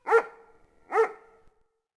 City Ancient Small Dog; Ambient; Civ4 beyond sword

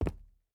footstep rubber boots concrete jump land; Rust

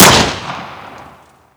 beretta, weapons

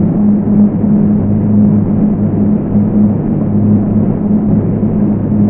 Aircrafts A-10 APU; DCS

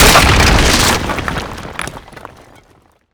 barrier explosion layer; Explosions; Call of Duty Modern Warfare

